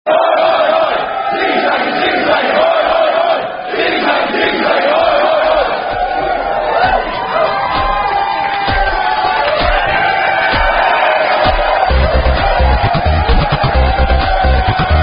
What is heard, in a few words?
Techno; Techno Made In Letzebuerg; Zigge Zagge Hoi Hoi Hoi (Ziggy Zaggy Oy Oy Oy In Luxembourg)